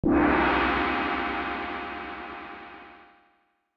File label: Strike the Gong!; Live-a-Live